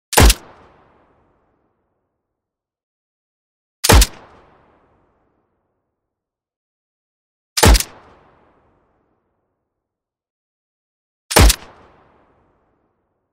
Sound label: AR StG 44 Mechanical Single; B00M